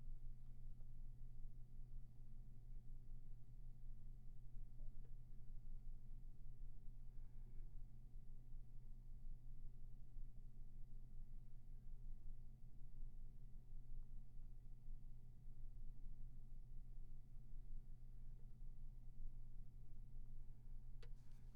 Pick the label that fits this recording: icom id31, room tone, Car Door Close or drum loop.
room tone